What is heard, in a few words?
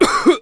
cough